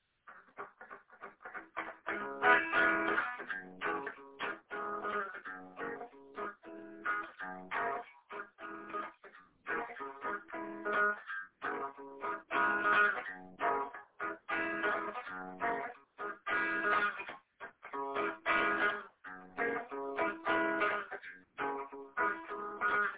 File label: jazzy box chord progression